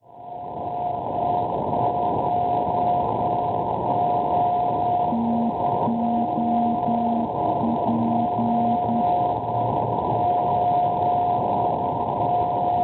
NDB, Torino-Poirino Italia 392.5 kHz; TOP; Schwarzsee

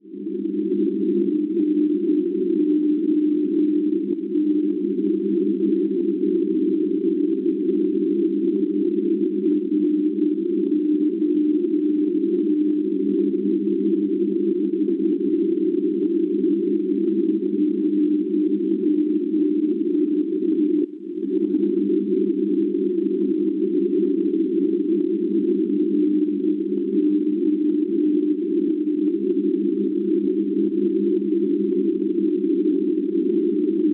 NDB, Rotterdam Paesi Bassi 350.5 kHz; Schwarzsee

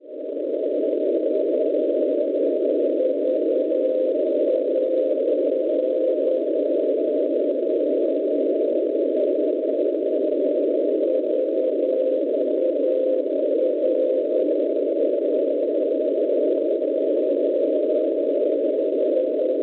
NDB, Stavanger Norvegia 319 kHz; VAR; Schwarzsee

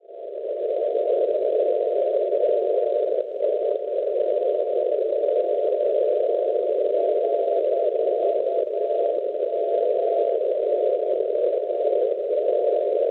NDB, Innsbruck Austria 320 kHz; RUM; Schwarzsee

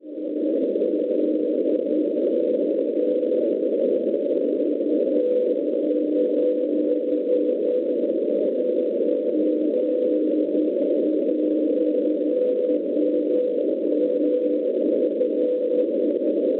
NDB, Orland Norvegia 349 kHz; TAR; Schwarzsee

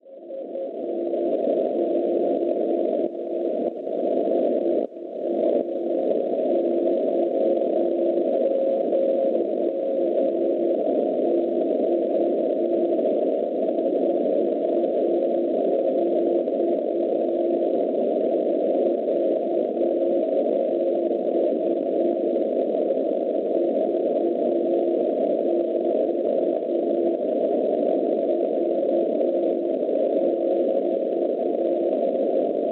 NDB, Vaxjo Svezia 329 kHz; VX; Schwarzsee

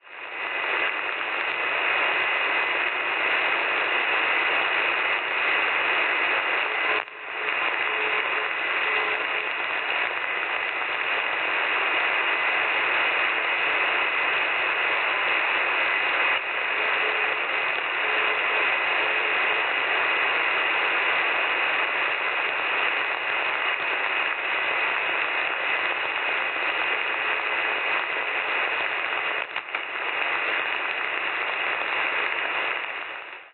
NDB, Roanne Francia 358 kHz; RNN; Schwarzsee

